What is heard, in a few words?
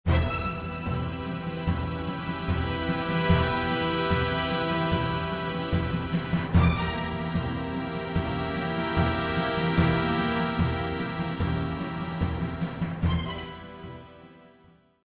start action; music kits custom